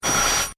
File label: static burst